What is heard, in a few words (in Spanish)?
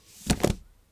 Caida